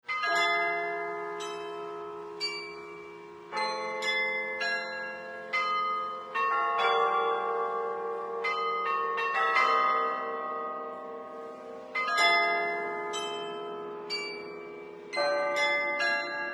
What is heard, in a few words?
carillon; Prière à Notre-Dame; Range: 4 Octaves